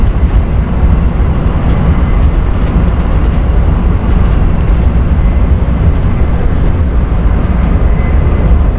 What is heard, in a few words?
run, Train; OpenBVE